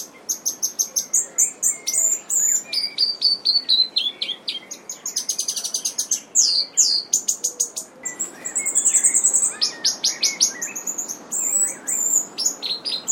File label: north island robin toutouwai territorial call